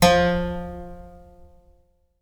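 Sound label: HARPSICHORD STOP F3; Best Service Advanced Media Trax Modern Composer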